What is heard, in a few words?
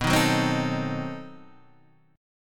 B 7th Flat 9th B, guitar chord; B7b9 Chord {7 6 4 5 4 5} strummed